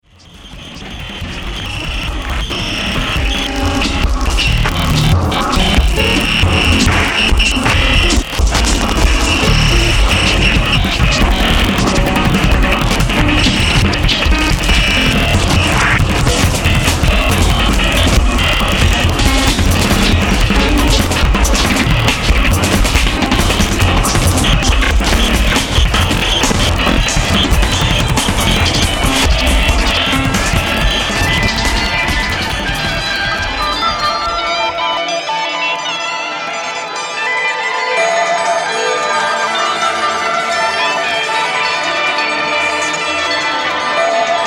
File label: drum check